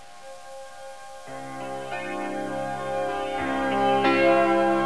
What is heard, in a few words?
torch